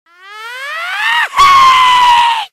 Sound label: iiiiiiihhhhhhhhhaaaaaaaaaa reverso